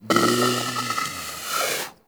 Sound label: Predator snore; Warframe; Hunting Critters; Characters